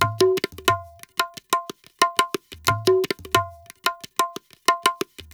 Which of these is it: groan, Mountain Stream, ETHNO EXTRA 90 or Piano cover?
ETHNO EXTRA 90